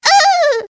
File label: crying weird; pip